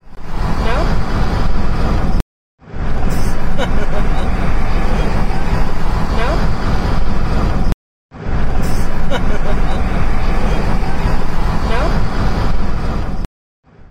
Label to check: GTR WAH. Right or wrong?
Wrong